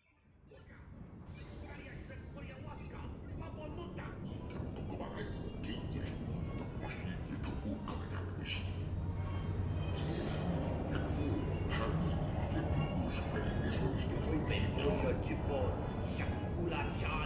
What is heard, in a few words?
amb workshop; ambiance; environment track